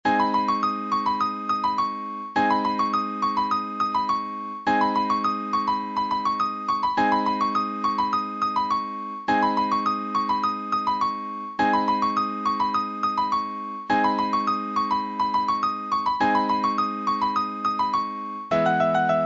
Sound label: Piano